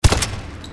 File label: Deagle